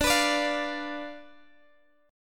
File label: D-Minor 6th, D, Guitar, Open G